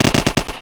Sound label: Rifleshot